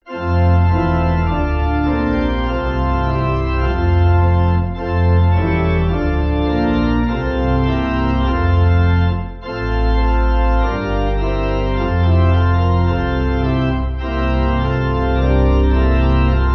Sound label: pipe organ, Music Backing Files for Churches; Christ Whose Glory; Lux Prima